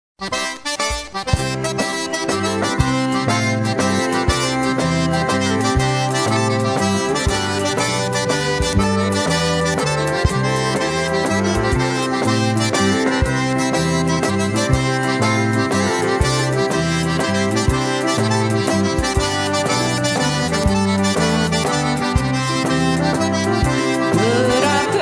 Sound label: Waltz